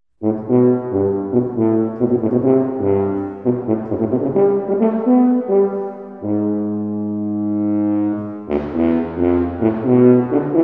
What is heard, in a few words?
Tuba; Planets